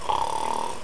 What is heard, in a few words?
snore; Curse of Dragor